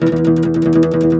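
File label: OUD tremolo, Plucked Strings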